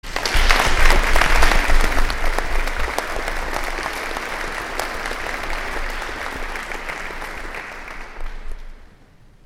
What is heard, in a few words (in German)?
Intro Applaus